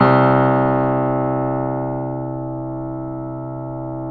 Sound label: Keyboards Acoustic, C1; USB Soundscan; AKAI